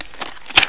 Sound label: RUB OFF